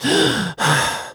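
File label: Realistic Fatigue Voice, male; oblivion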